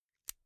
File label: insert xlr extension latch hard quick; objekte; elektronik